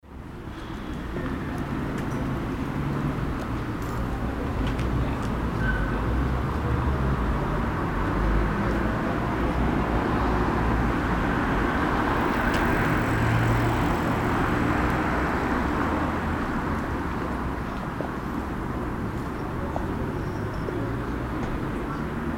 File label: street atmo medium traffic